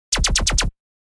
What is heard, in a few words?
Sci-fi weapon assault rifle bursting